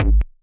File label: nasty stormfront, Electro FX